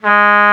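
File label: Trumpet mf G#2; Digital Sound Factory Virtuoso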